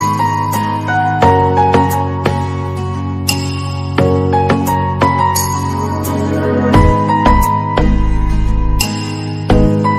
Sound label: love feeling flute instrumental ringtones; Sumsung Ringtones 2023